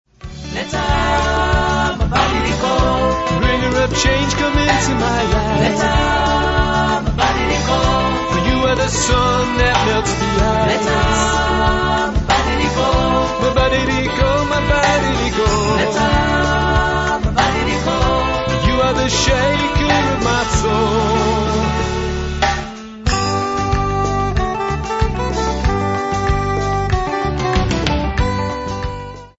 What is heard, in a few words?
shaker